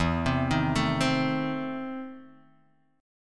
E Major 7th Suspended 4th Sharp 5th E down Guitar Drop D